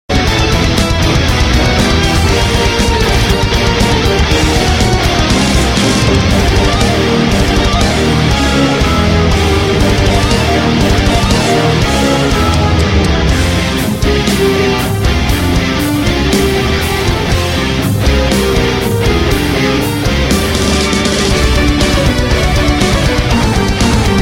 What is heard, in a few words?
Halloween Guitar